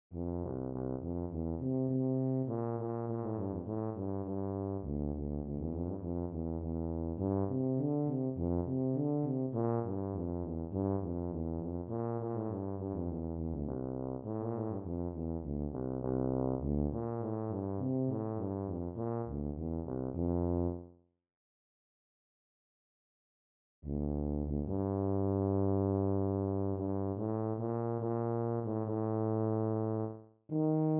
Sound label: Tuba